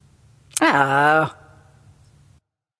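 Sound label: ugh, female; smite; voicepack; hera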